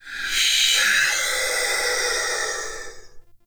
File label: Binaural Whispers; Ambience; Warframe; Shadowgrapher